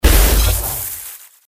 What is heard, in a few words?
ulti back hit